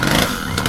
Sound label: saw idle; weapons; quake2